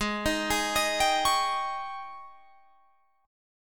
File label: G sharp Major 7th Suspended 4th G sharp, Guitar Open F; G#M7sus4 Chord